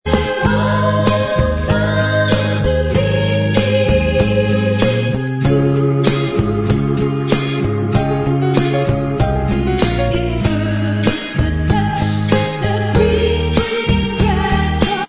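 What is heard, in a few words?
Bvox Track, backing vocals track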